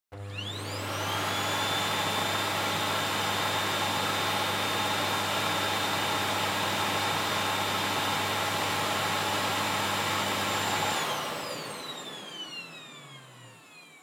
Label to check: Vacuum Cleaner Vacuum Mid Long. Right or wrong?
Right